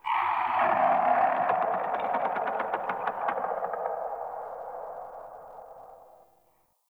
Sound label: Three Trees Talk; Ambience; Corrupted Ode